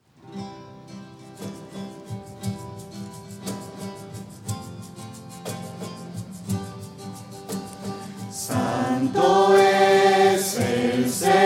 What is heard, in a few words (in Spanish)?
santo shuwa voces; misa